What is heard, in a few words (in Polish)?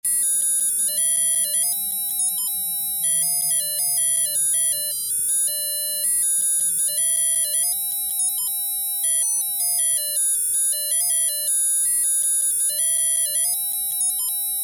pokaz dzwieku alarmu